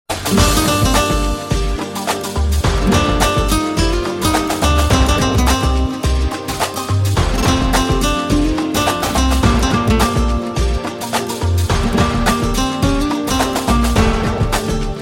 Rings

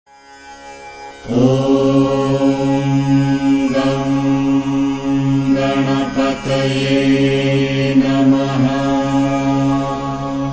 Ganesha Mantra Religious Ringtone; Devotional Ringtones

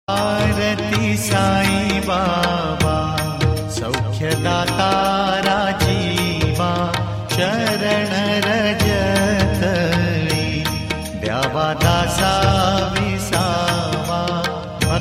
arati sai baba ringtone; Devotional Ringtones

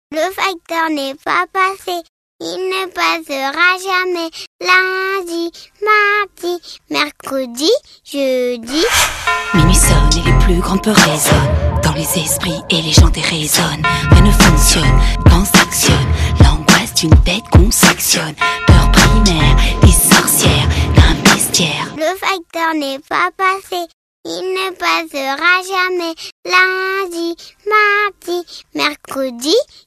singer a baby, Funny baby ringtones

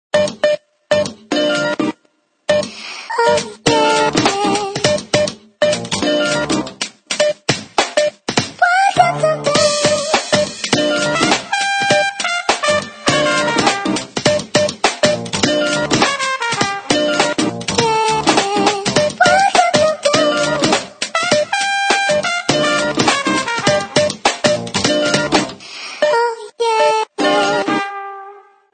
Nokia Ringtones; Original Ringtones Nokia 6101

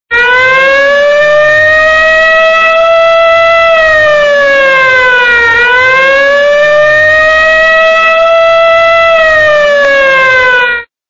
siren, Sound Effects Ringtones

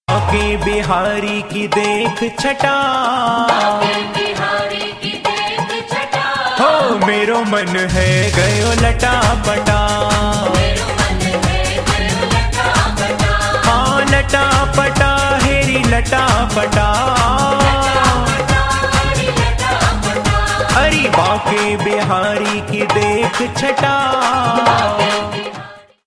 Bhakti Bhajan Ringtone

